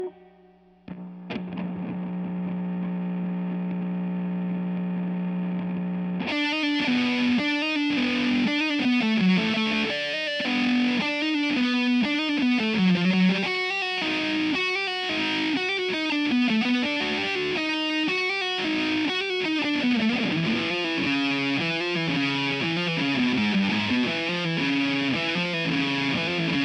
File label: GTR